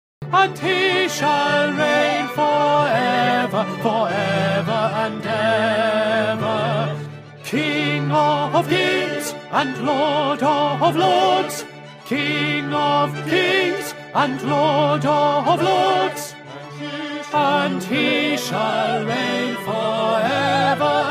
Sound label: Audition Practice Hallelujah Tenor; ESYO Melodies